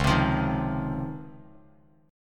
C sharp Major 9th C sharp, Bass Standard; C#M9 strummed; JGuitar

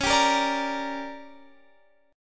D Major 7th Suspended 4th Sharp 5th, chord

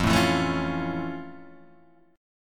F Augmented Major 7th F Guitar Open G

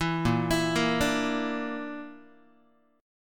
Bb Major Flat 5th, Guitar; arpeggiated; JGuitar

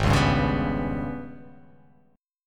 Ab Minor Major 7th Double Flat 5th, down; AbmM7bb5; strummed

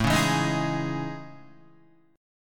A Major Sharp 11th, Guitar

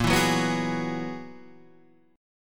Gb 11th Bb

